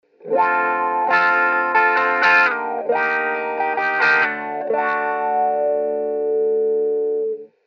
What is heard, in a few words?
wah